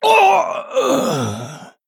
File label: Male Human Death